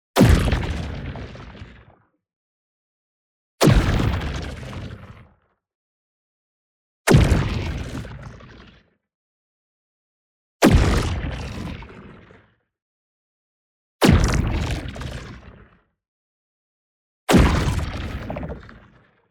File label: IMPACT LARGE Super Disintegration; boom library; Matter